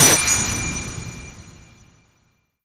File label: bottle drop fast